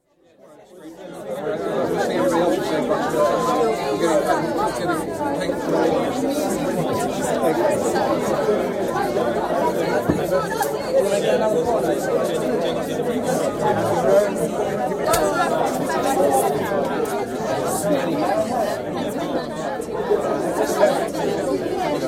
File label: Cocktail Party, close perspective animtaed chatter at lively party; Bars And Club Sound Effects; BBC